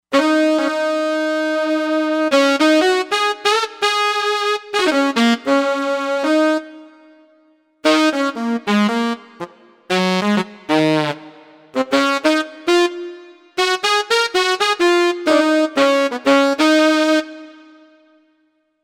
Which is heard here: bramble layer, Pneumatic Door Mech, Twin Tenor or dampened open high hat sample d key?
Twin Tenor